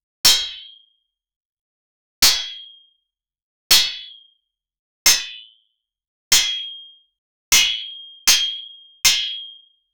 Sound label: trident hitting stone flo